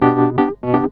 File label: E.PIANO, KEYS